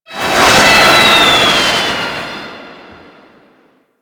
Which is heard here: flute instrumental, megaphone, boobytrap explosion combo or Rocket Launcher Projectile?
Rocket Launcher Projectile